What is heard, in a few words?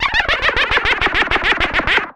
LAUGH, Anime, COMIC; Sound FX; AKAI Anime Sound FX